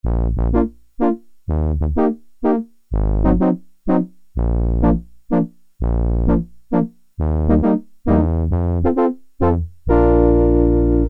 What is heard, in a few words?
Horns, Korg Poly-61M